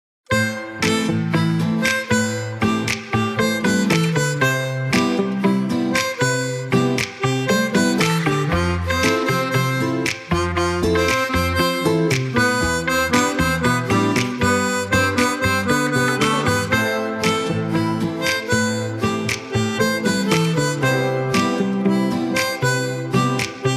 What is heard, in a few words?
Harmonica